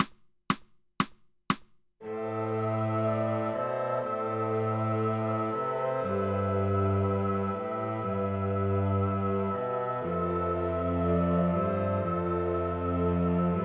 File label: accompaniment; dark forest